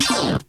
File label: stab; ZERO-G; Datafile